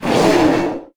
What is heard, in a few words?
Monster; Zenless Zone Zero